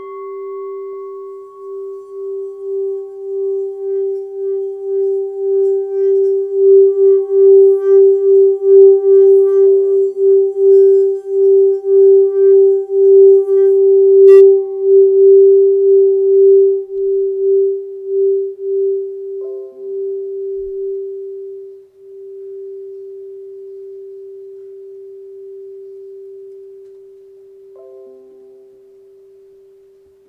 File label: Blessings Bowl red g singing bowl